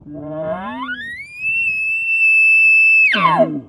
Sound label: roundup bugle, elk sounds; elk sounds explained